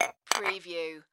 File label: Tea Cup Set Down